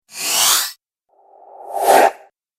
Whoosh Duo Pack; Whoosh Sound Effects; Andrew Fleming

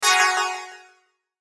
Hit Chimes Flange, Hit Chimes Flange Delay; Production Element Imaging Element Accent Transition

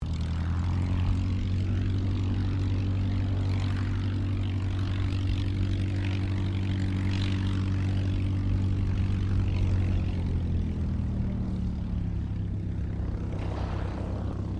AIRCRAFT DH82A DEHAVILAND TIGERMOTH TAXI; RAAF Tigermoth; Airplane Sound Effects; Vehicles

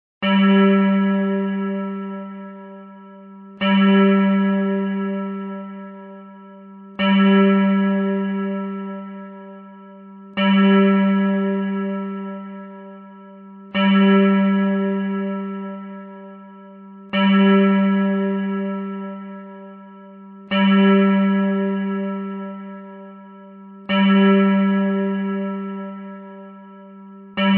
Church Bell, Church bell rings mulitple times; Bells; Musical

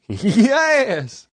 Heehee Yes, Exclamation, Male; VoiceBox

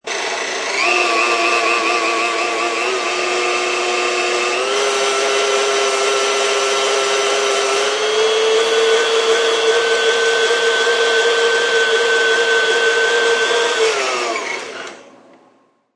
A blender blends; Kitchen; Household

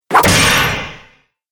Great Sword Smashes Chainmail; Sword Sound Effects

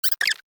Silly Cartoon Critter, cartoon voice; Cartoon Voice Sound Effects

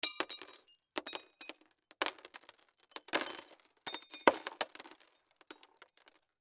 Debris Glass Objects Drop Break; Debris (Sound Effects:Materials); Foley; Blastwave

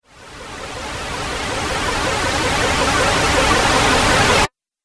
Trailer Riser White Noise; Rise And Dive

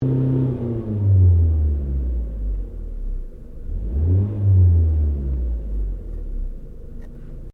CAR FORD FOCUS 2009 REV INTERIOR; Ford Focus hire car; Vehicles

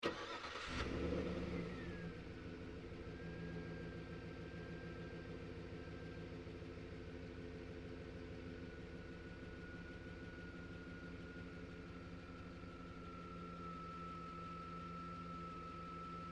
Truck GMC Savana Onboard Start Idle Off; 2010 GMC Savana; Trucks; Vehicles; Big Room Sound

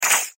Button Multimedia; Web Interactive; Buttons; Technology; Blastwave

